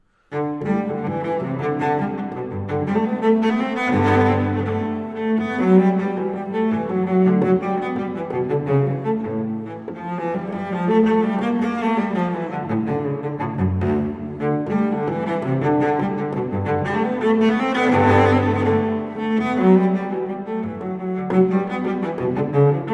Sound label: solo cello; Suite for solo cello No. 1 in G major, BWV 1007; 6. Gigue; Bach